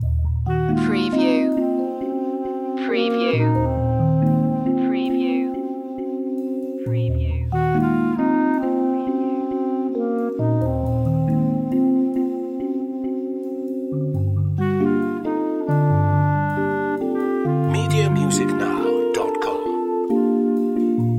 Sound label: loop